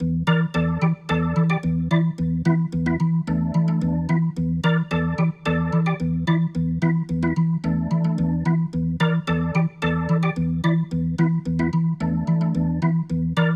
Rotor Riff, Organ

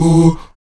VOX AHOO, Afro Chants, Stacc Ah Oos

